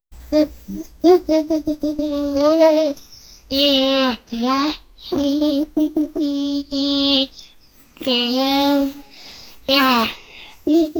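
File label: a lovely young girl pant; voice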